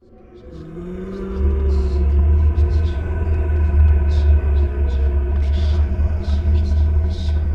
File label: look outside noises